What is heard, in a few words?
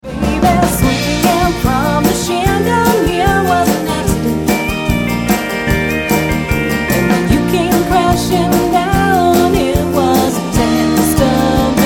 swing